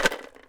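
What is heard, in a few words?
ELM RATTLE, PERC; AKAI S6000 CD-ROM - WorldSounds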